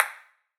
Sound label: Ping Pong; Ed Edd n Eddy; Adromulus Pack; EEnE